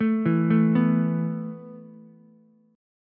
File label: B 7th Suspended 4th B down Ukulele; B7sus4 arpeggiated; chord shape; JGuitar